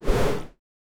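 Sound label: whoosh, air rush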